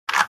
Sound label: Rubiks cube rotating solving